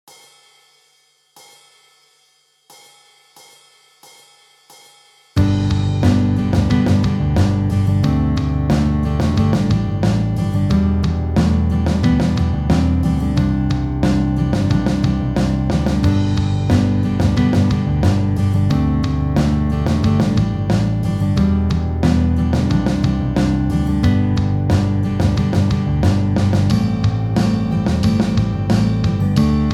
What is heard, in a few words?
german folk song, guitar